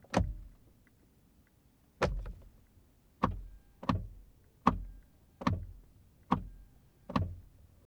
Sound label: Interior front switch headlights short long, Honda Accord Type S; mono; Ivo Vicic